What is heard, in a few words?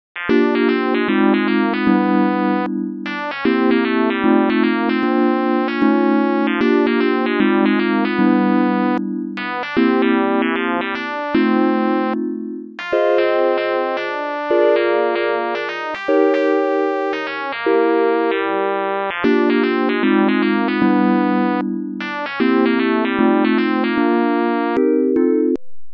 Swing Example